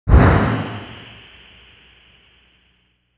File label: smoke clear, smokegrenade; weapons; Counter Strike Model Pack; CS2